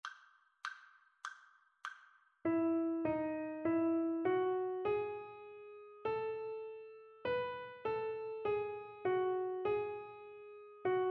melodic dictation

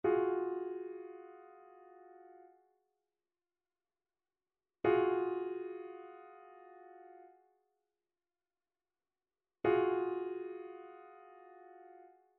trichord; trichord identification

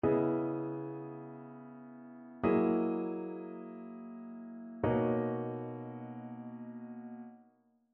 ii V I progressions; ear training

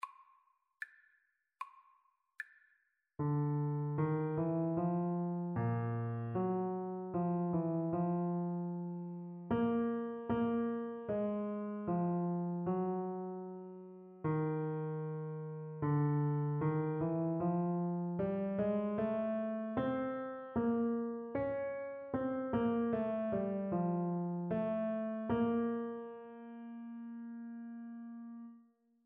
melodic dictation; ear training

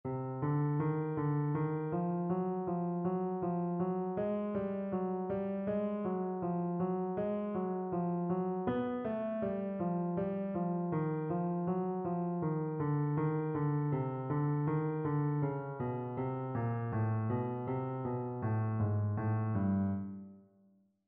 melodic error detection; ear training

